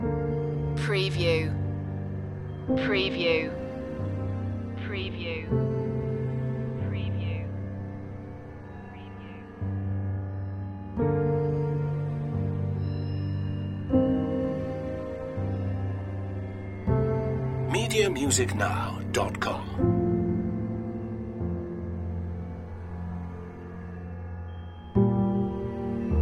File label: soul talk loop